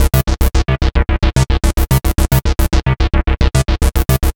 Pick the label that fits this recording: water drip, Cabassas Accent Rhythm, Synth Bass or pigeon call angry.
Synth Bass